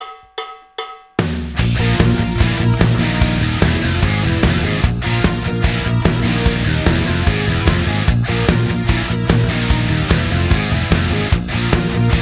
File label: Cover Band; Orlando